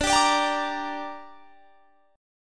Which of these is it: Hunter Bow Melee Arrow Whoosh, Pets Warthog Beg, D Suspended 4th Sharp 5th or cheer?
D Suspended 4th Sharp 5th